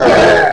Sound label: pain, player; QUAKE2; cyborg